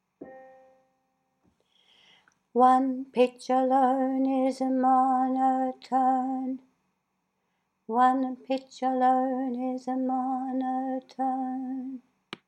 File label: MONOTONE